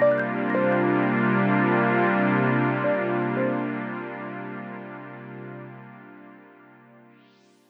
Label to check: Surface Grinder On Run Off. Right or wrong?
Wrong